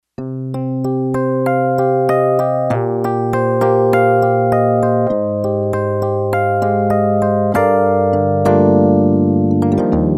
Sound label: Knock Road, Yamaha TX802; Factory Patches; Voices